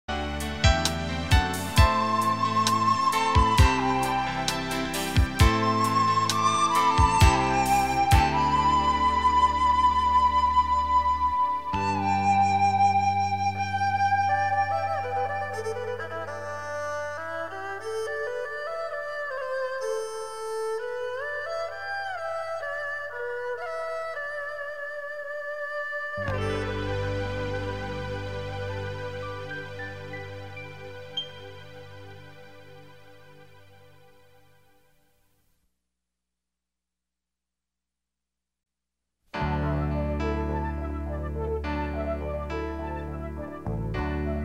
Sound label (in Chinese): 广告片音乐